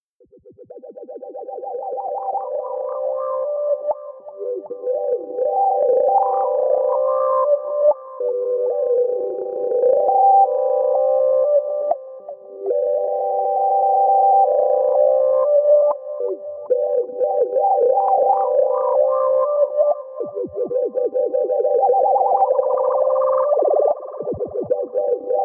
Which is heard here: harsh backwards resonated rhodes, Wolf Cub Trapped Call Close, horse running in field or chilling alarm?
harsh backwards resonated rhodes